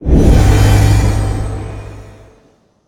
buff activate; smite; poseidon

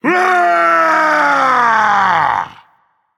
battle cry, dragon; fafnir

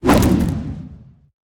basic swing; Smite; Zhong Kui

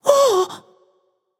breath, voicepack; smite; jorm

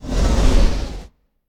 slither; smite; kukulkan

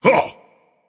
surprised, dragon; fafnir

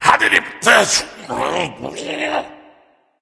grumbling; cuchulainn

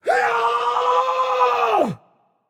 battle cry; smite; voicepack; rama

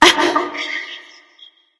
surprised light; smite; voicepack; hel

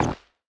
movement step; smite; odin

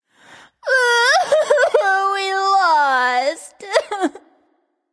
boohoo; smite; terra

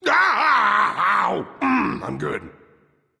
ouch; smite; voicepack; vulcan

